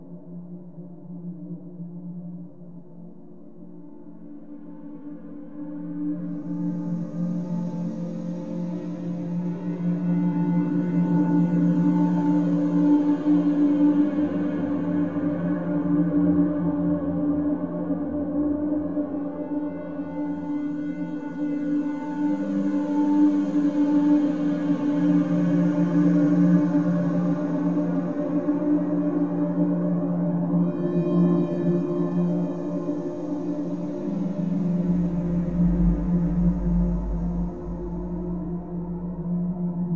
Slave Office Music Loop

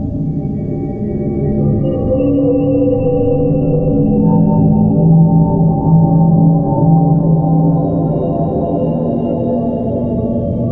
Slave Cave Amb Loop

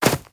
Grass Run

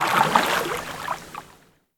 Water Splash

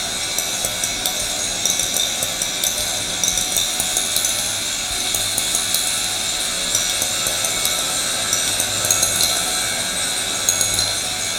Marbles Loop

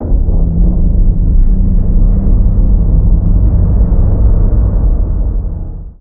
Sphere Rotation Start; moul-assets

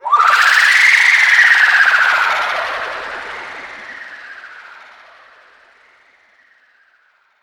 Pain Scream; Bahro

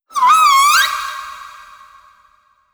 Critter Female Verb; Kahlo

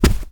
Rug Run; moul

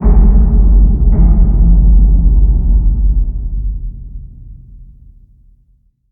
Random Subterranean

